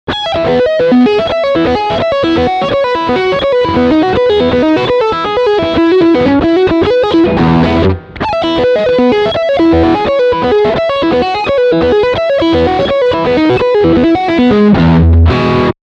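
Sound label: Steg Singlecoil Lick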